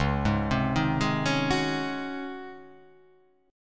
Csharp Major 7th Suspended 2nd Suspended 4th Csharp down, chord